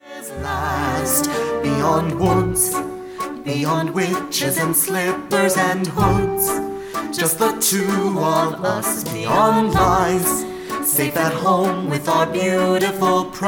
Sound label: male lead, practice